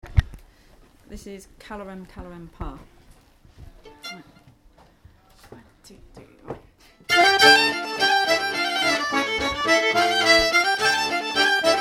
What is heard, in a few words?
Bourrees; Calloren Calloren Park